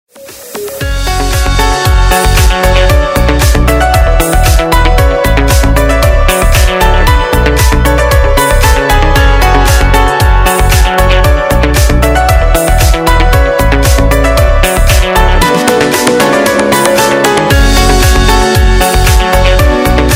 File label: Ringtone